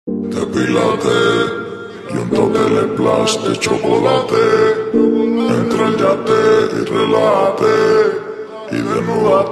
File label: depilate